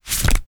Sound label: paper; empire game; settlement alert new law or policy card